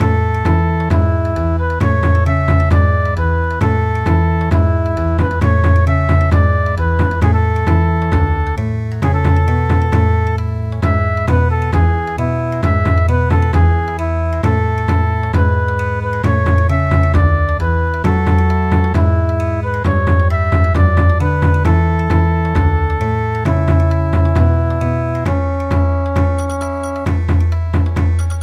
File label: Bon Odori